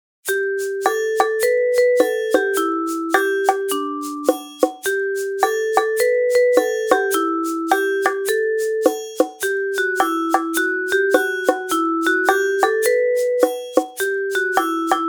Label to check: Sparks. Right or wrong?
Wrong